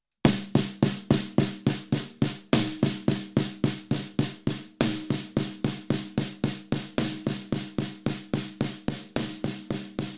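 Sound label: Channel Snare 2264 JR Threshold 12 Ratio; Heritage Audio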